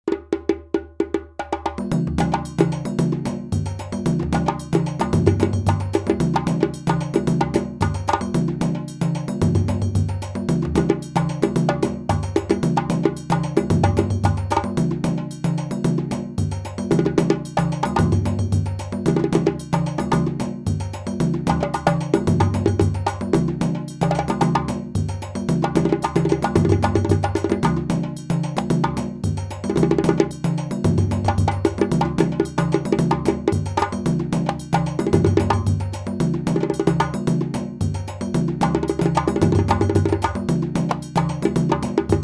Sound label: Improvisation 112 bpm, djembe